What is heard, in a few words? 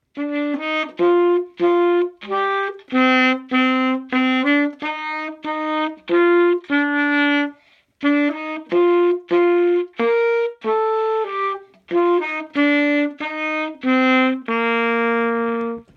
Sax; Schneefloeckchen Weissroeckchen